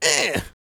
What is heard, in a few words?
A YELL, MALE HUMAN; ILIO; Vocal Planet